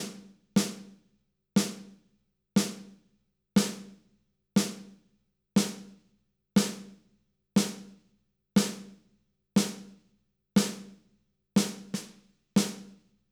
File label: Snare